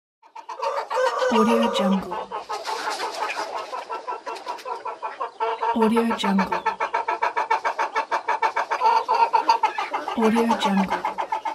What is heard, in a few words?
chicken house